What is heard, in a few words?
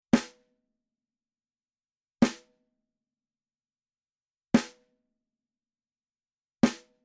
SNARE Onyx Center Hard; evans; naciagi do tomow uderzany